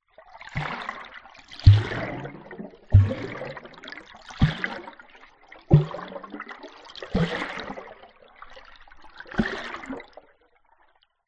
nature water underwater small splashes several hydrophone; zapsplat